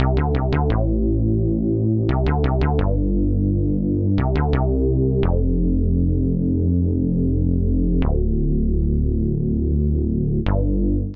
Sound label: Midnight Bass A Minor 86 bpm, Bassline; Tonal; MIDI Clips; Drive and Glow; Ableton; Factory Packs